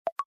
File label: Usb Effect, ui; Infinix Hot 12 Play